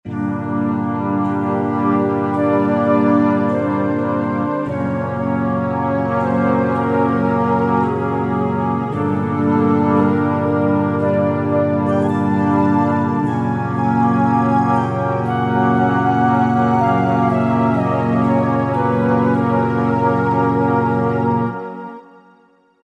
Wind Ensemble, Roland SR-JV80-14 World Collection Asia